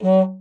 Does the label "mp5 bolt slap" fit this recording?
No